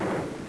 Exp OffScreen; America Invades! Demo

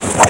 drive; Computer Buyer 1997 August; Jack

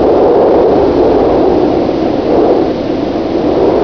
light wind; PC Gamer IT CD 41; Daikatana

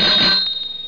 BIKE BELL; Explorapedia; KidsRoom

